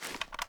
reload empty fast mag rotate; gmod

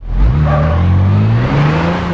first, vehicles; gmod; tdmcars; transit

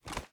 reload fast drum start, reloads; gmod

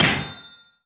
frag physics bounce metalgrate hard; gmod; viper

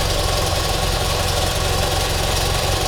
v8 idle loop; commoncar

